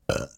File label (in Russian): kachestvennaya zapis otrizhki